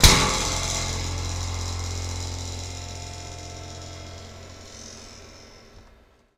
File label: SPRING; Rarefaction; Poke in The Ear With A Sharp Stick